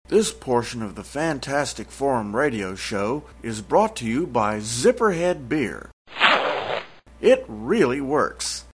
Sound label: Beer